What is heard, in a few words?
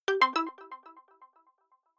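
jam, electronic; signale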